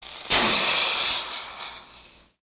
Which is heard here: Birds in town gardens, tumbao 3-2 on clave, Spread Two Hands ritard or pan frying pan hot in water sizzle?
pan frying pan hot in water sizzle